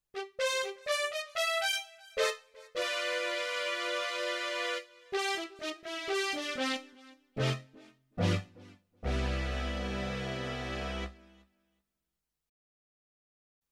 Brass

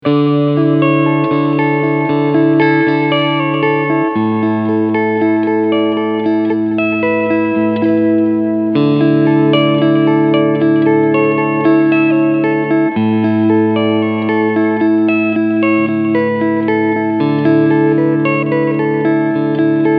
Front Middle SC Clean

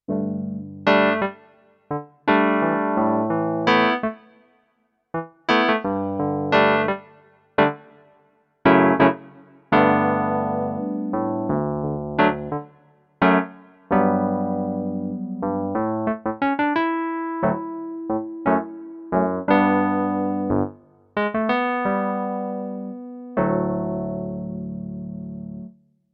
Yamaha P-S500 Vintage E-Piano

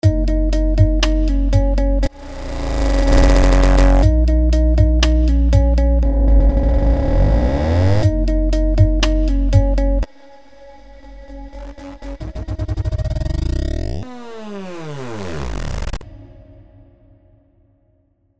iZotope Stutter Edit 2 Cinematic SFX

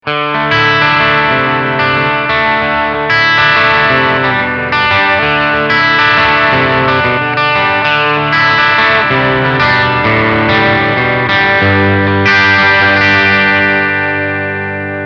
harley benton te 90qm hh trans blue

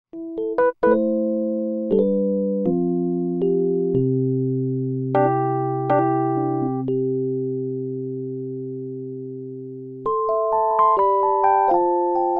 S750 Rhodes MK V

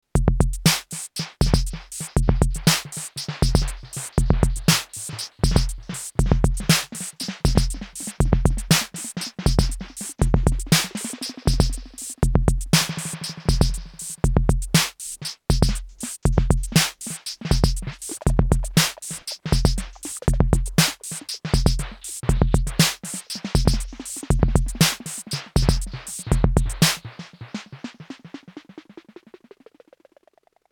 Tape Echo; Blck Noir

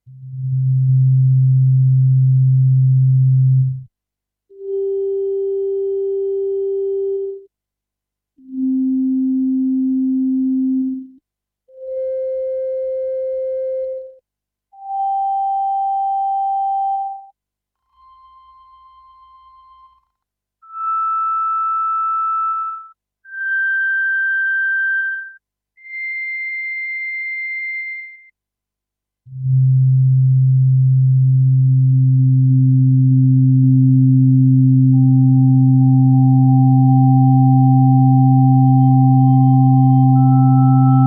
Hammond SK1 Drawbars Dry

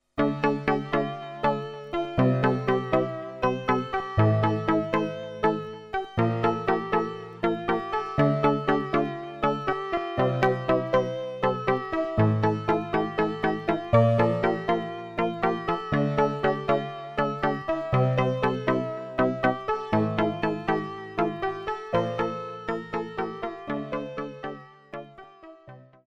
Korg Trident

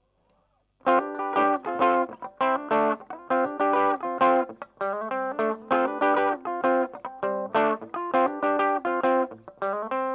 bend clean 2 gain

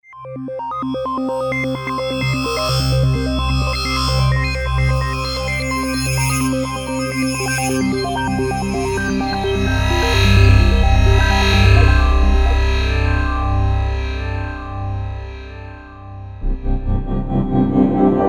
pads

